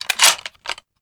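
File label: supplybox drop; cstrike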